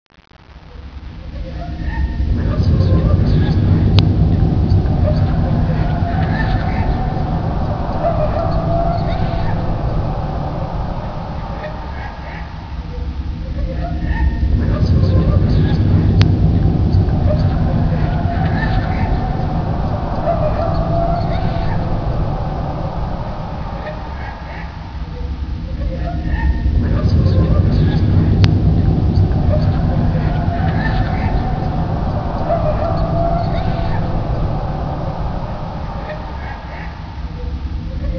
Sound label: horror, ambience; cstrike